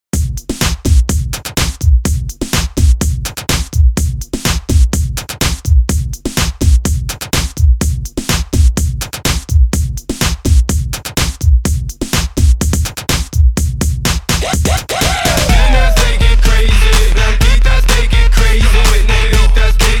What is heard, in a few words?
bpm 125